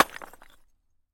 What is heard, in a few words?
wall brick; Golden Crayon Sounds